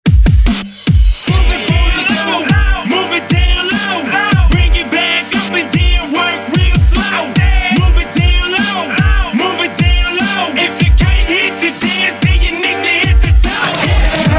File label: Classic Hip Hop Hype